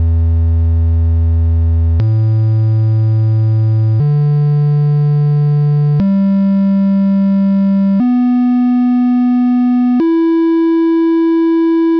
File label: gitar tune